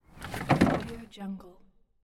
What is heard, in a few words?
open old car hood